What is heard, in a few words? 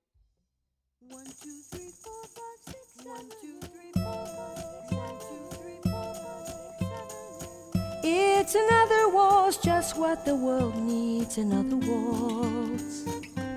Another Waltz